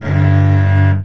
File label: drag across strings, cello